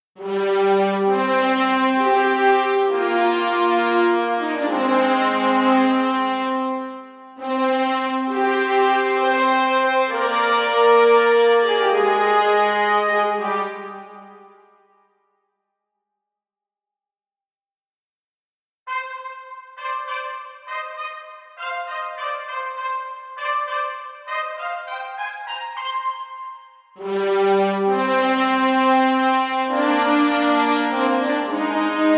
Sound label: high brass sus stem